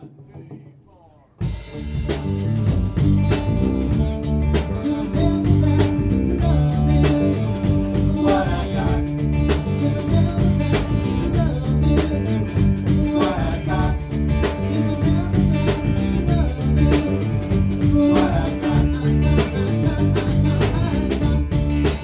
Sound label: medley